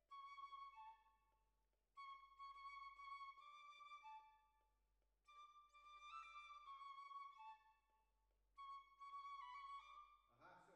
Bassoon, Jazz for piano

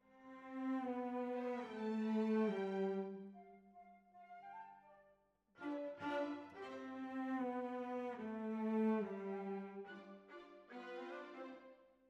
Cellos

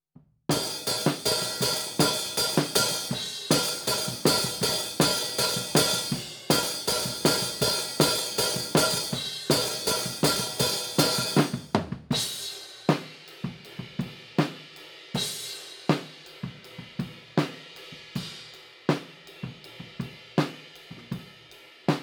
HiHat, 160 BPM

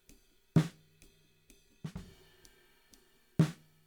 SNR Top

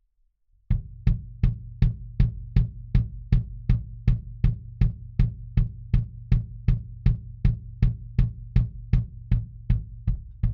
Kick In, 160 BPM

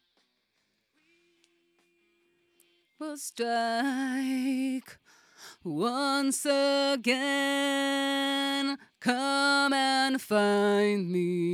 Vocal

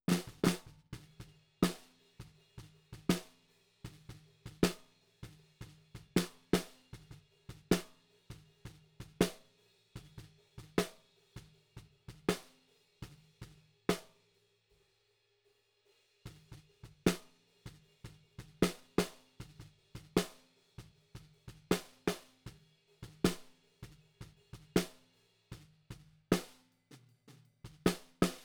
SNR B

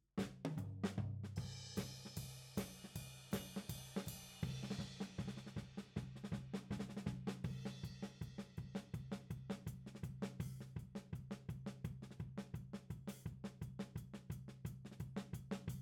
Tom

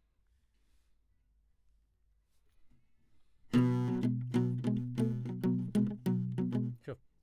Classic Guitar

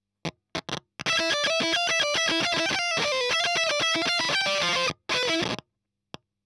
EL Fuzz End

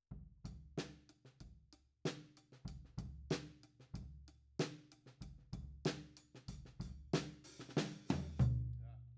Floor, 94bpm

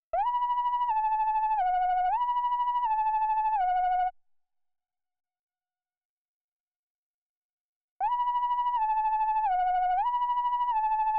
MOOG

